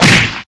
cri fist, Battle; CRITICAL SE

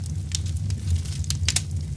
firewood; EnvSound

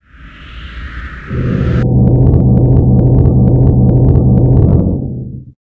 boss gate die

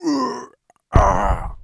mob die; Monster; 야수맹 흑룡결; 웅수신왕

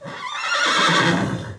horse

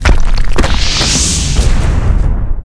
Mob die; Monster; 패왕릉; 순창병

